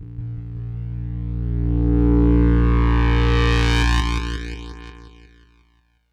bass one-shot; KJ Sawka - Drum n Bass & Beyond

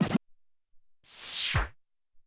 Scratch

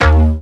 synth note D#; Virtual Riot; hotpursuit

